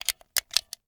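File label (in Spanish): CARGAR BALA, load bullet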